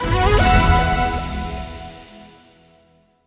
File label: small Win; netent; starburst